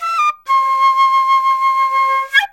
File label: SERULING, Heart of Asia